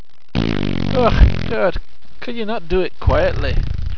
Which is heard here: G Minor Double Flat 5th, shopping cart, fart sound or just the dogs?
fart sound